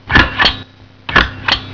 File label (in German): LOCHER